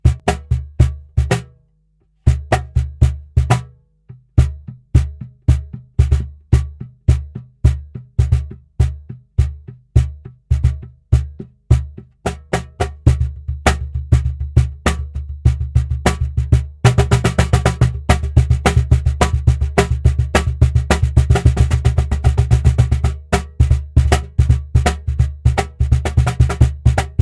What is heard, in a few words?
cajon on behringer xenyx 802